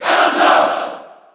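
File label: Cheer Japanese; Ganondorf; SSB4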